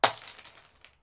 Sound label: glass, Guns hit